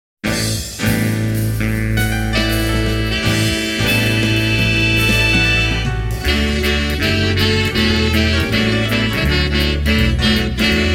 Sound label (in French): Hymne BigBand